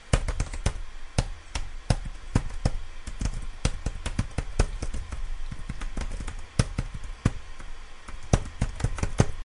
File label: typing, keyboard